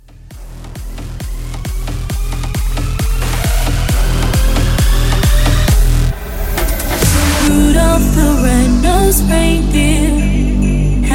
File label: Christmas Festival Hybrid Edit